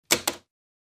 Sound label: Pressing a button on a ballpoint pen (on the table)